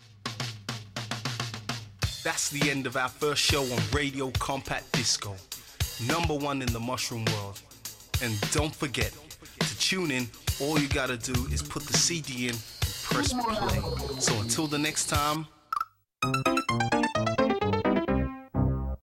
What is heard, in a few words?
Funk, Radio Compact Disco